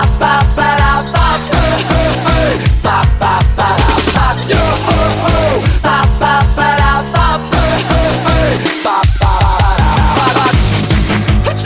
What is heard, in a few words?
paa paa paa ringtone, samsung